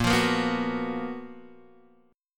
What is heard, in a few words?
F sharp Major 11th A sharp